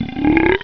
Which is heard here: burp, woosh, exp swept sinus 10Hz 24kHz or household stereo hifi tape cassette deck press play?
burp